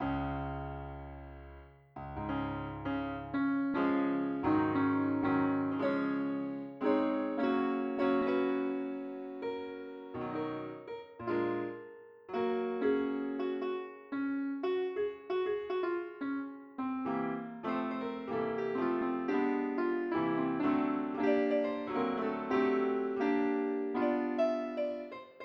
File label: piano